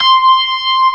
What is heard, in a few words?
B3 C 6, R; Best Service; Advanced Media Trax Modern Composer; B3 SMALL1